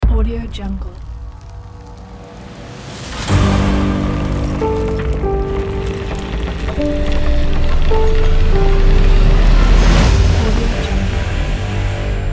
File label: hybrid reveal intense logo